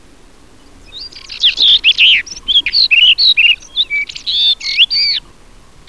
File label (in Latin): Monticola saxatilis